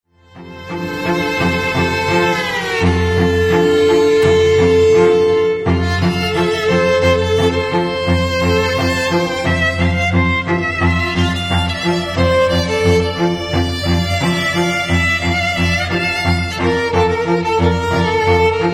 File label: String